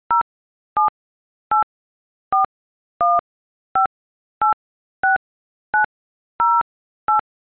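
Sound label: iphone keyboard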